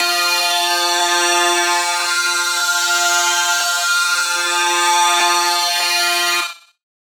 Lead Shot F Thin, Dubstep; One Shots; Ghosthack; Dubstep Sound Arsenal

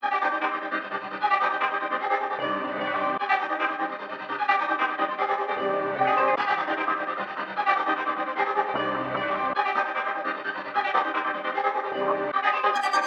Jungle 151 BPM C Min, Melody Loops; Cymatics

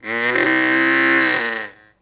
Bronx Cheer; Vintage Cartoon; Comedy Vocals

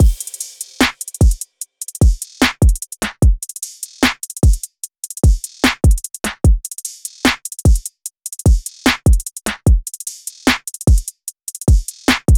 Drum Loop 149 BPM; Promise; Cymatics; DOPE DRUMS VOL. 1

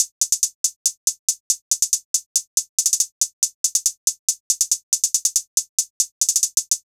Hihat Loop - 140 BPM, Hip Hop; Cymatics; 9God Hip Hop Sample Pack

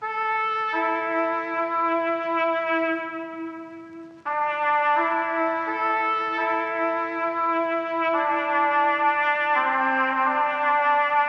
85 BPM A Min Horn; Stems; Cymatics; Cymatics - CHAOS Beta Pack (MIDI + Stems); Broken Record

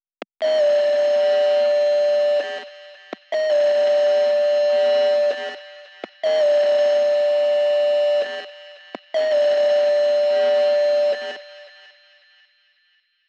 165 BPM G Min Lead, loop stems; Melody; Cymatics; 2022 Melody Collection - Wavy; Painless

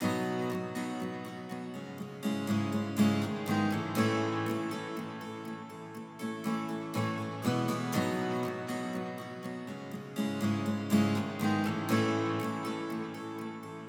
121 BPM C# Min Guitar, Pop; Melodies; Loop Stems; Arena

